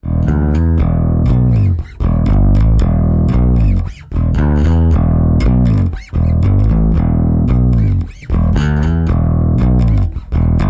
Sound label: bass; ferox